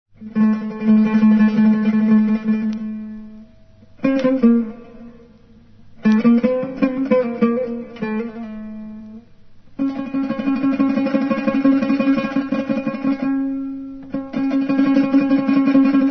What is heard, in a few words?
swehli; arabesques